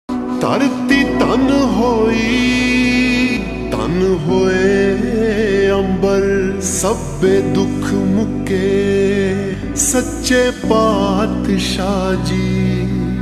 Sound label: Ringtone Slow Reverb